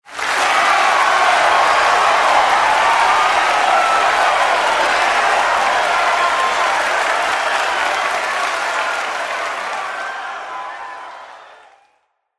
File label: crowd Cheer; Highland Reels